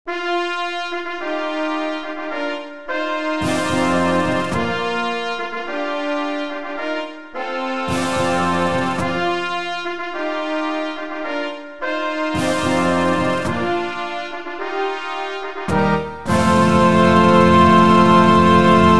arrival fanfare; grade 2

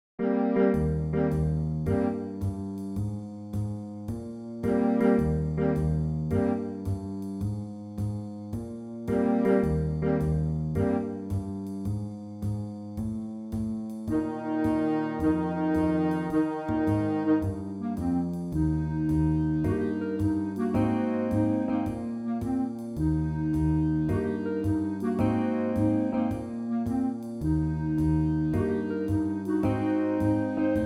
jazz combo

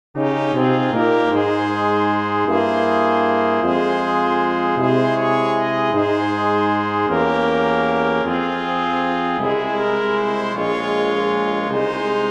lift every voice and sing brass quintet

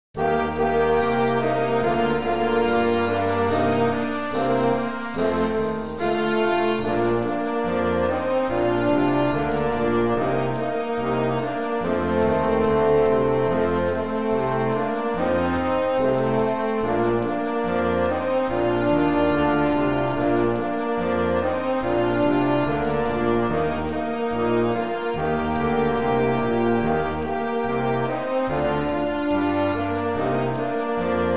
primary march

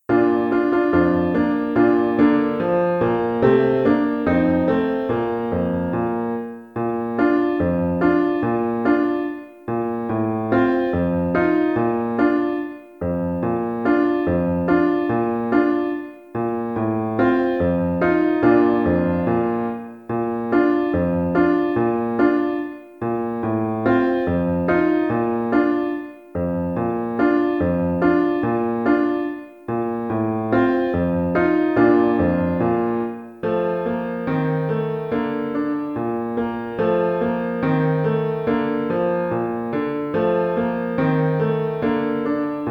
cakewalk accompaniment; elementary solos accompaniments

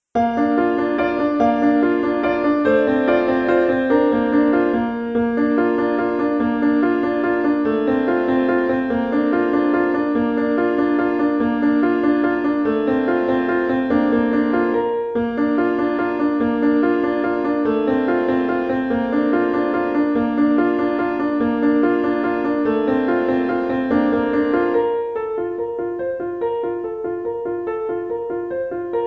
minuet accompaniment; elementary solos accompaniments

